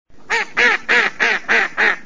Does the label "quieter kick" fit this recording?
No